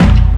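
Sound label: Keepup Kick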